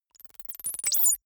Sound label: confirm micro click; maschinen; signale; soundpool